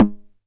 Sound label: 909 SD Tape; Goldbaby Tape808 & Tape909; The Tape 909